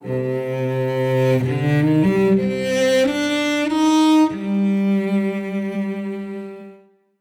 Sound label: Intimate Strings Cello Melodic Phrase in C Minor 100 BPM; AMUSIA